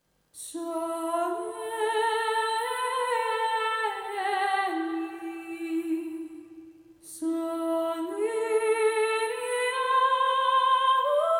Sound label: Phrases Wallfahrtskirche Maria Strassengel; VSL MIRPro3D; EW Elves